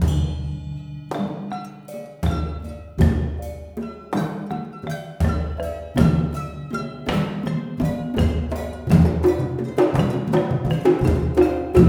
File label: MALAY L, Heart of Asia